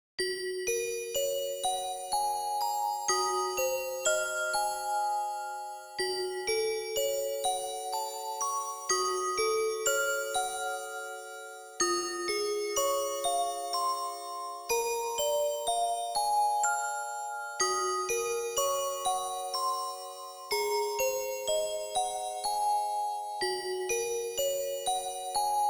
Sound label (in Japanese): オルゴール; Harukanaru Toki no Naka de 3